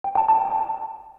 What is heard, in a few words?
menu back; osu!; Android 4 Kitkat